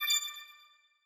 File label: mail; geoscape